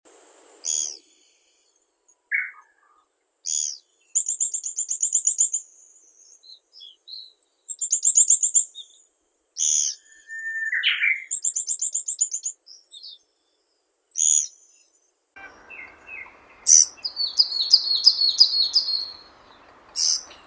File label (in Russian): dyatel malii ostrokrilii dendrocopos kizuki